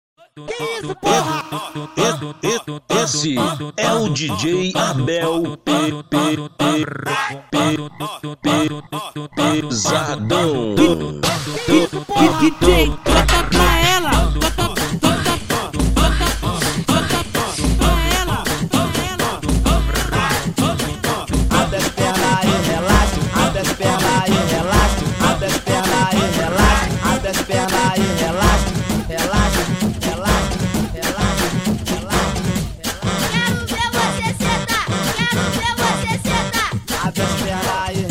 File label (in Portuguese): base de funk montagem; funk capixaba